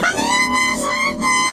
ahh

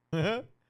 quick chuckle

